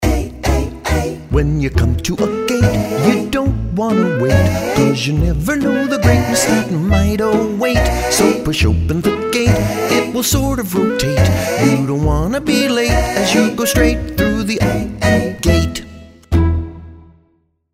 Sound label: Ae Song Gate